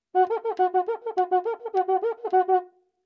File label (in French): Cuica variation